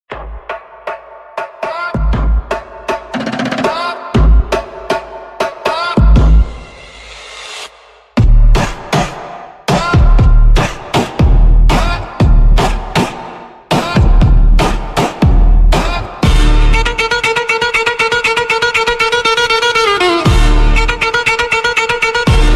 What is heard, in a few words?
Airtel Funk reverbed